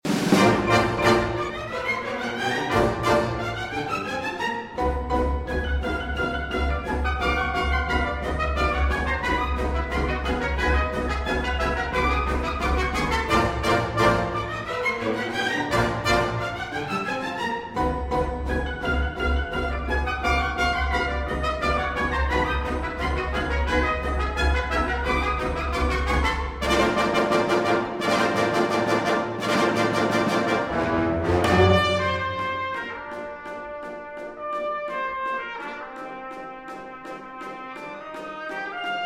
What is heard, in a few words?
Dance Variations Galop